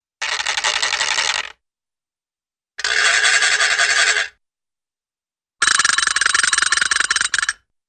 Whirring Wooden & Metallic Objects Metallic Clicks, cartoon; Hollywood Edge; Cartoon Trax